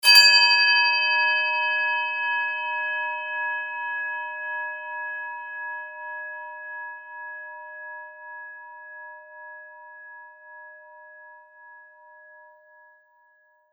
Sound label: japan rin bowl 3 crash